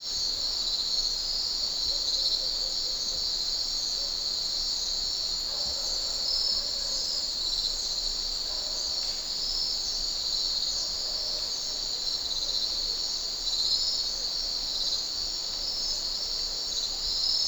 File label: Animal Crickets